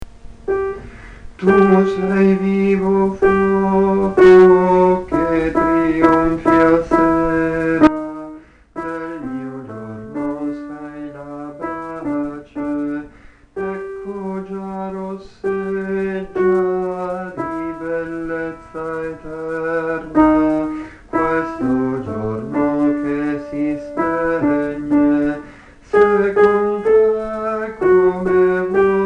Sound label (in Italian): contralti; Tu sei vivo fuoco